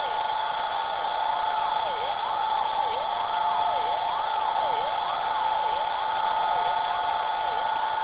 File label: Molniya sweeps 1000MHz